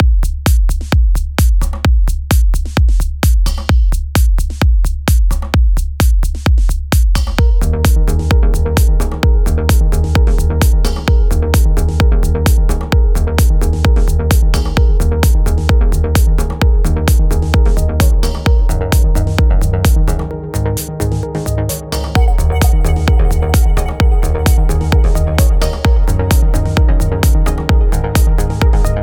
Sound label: sawpluck trance theme